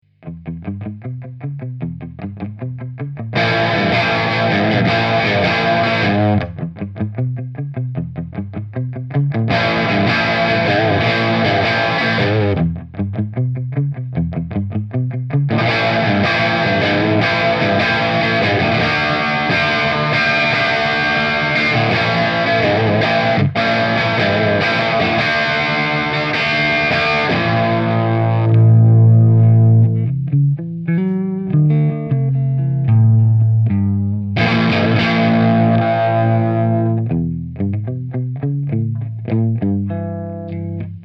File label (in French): dynamique